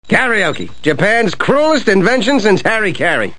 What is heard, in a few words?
karaoke; DuckMan